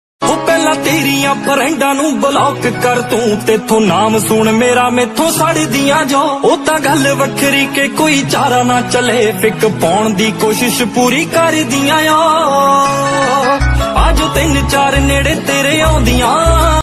new punjabi ringtone song ringtone new punjabi sad song ringtone